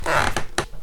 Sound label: Wood Floor Creak